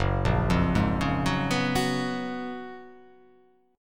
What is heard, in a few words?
Gb Major 11th; GbM11 arpeggiated; JGuitar